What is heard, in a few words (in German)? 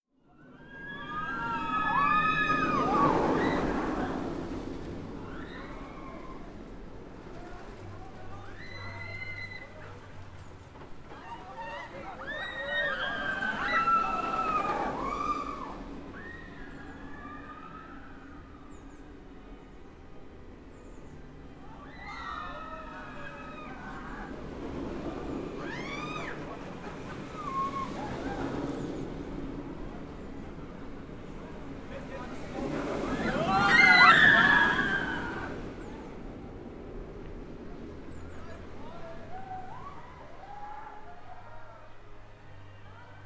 blue fire Schraube